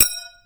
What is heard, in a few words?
ting TING